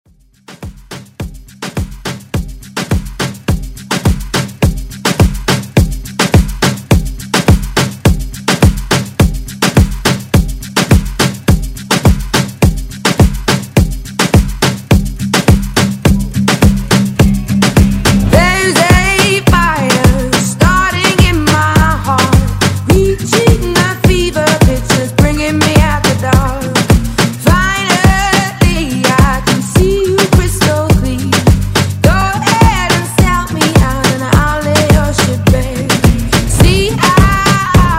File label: Moombahton Redrum